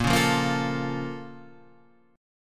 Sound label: Bb Minor Major 7th, Guitar, Standard, down; BbmM7; strummed; JGuitar